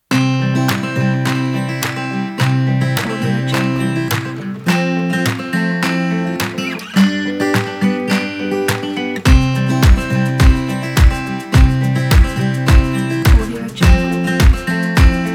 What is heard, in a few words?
the folk